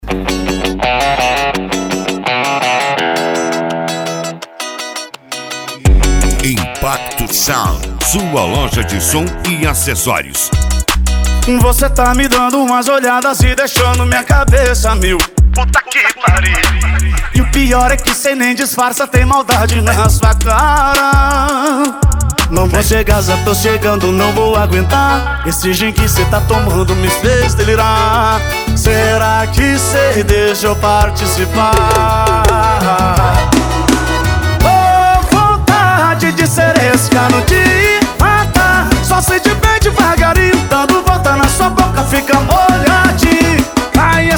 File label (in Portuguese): sound especial sertanejo; Impacto Sound Especial Sertanejo